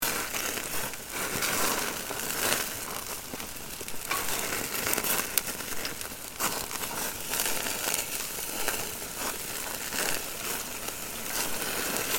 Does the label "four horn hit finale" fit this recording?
No